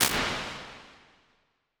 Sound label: Plates Modern Echo Plate R; Impulse Responses; Ableton Live 12 Lite